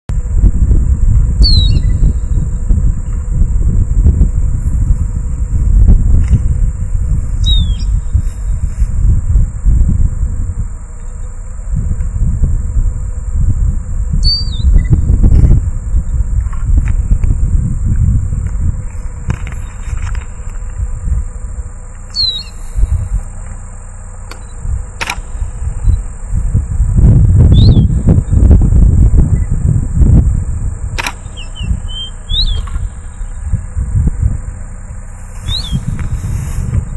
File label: Sporophila ruficollis; Ruta 40